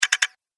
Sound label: Sharp Clicks Triple Press, click; Andrew Fleming